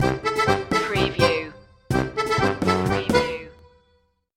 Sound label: cajun stinger